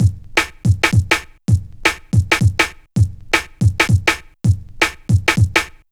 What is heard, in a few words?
162, breaks; M8